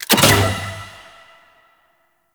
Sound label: Puzzle Switch Button Hit; Enemies; Warframe; Kela De Thaym